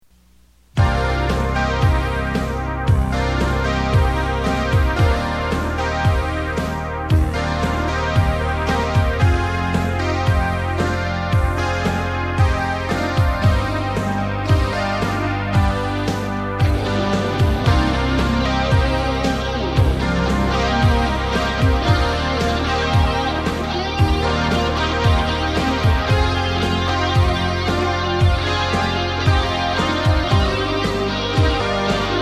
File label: Warmin Up, guitar